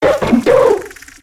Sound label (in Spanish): Grito de Trubbish